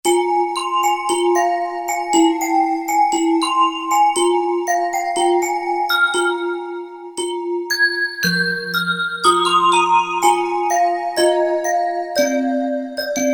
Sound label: Fanta Bell, Roland U-220; Factory Patches